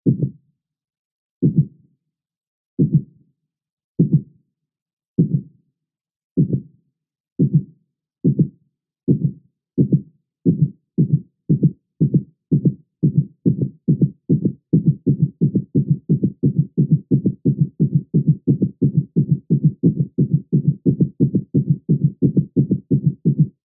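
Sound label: heartbeat speed up low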